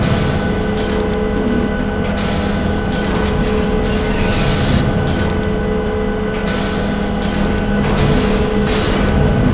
ambience generator; titanic

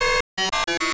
think, ambience

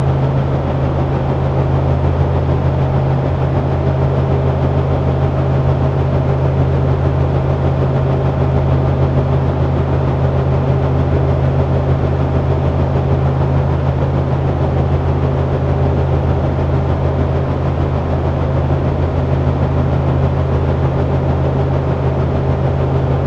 big fan

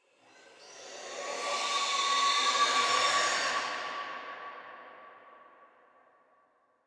distant scream

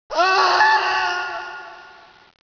man screaming

